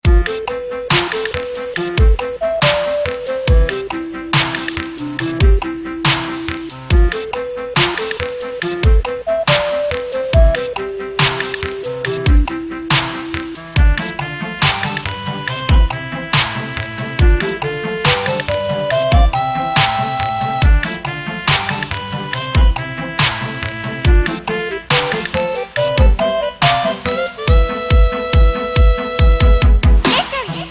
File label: Dubstep Remix